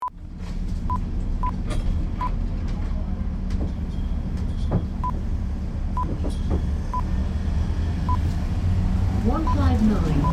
London Bus; 159

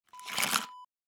candy box pour

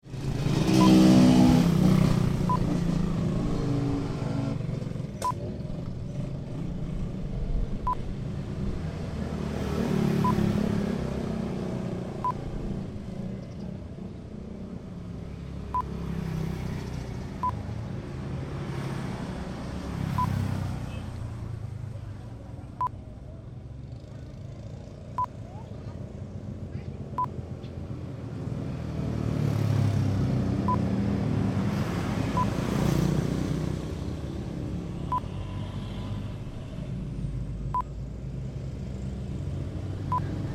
Bali street motorbikes passing

